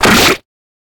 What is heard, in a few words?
sword kill